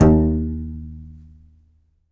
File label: C. Bass Balalaika, Plucked Strings